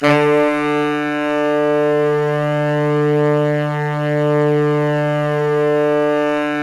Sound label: SAX, Tenors; Giga Samples Collection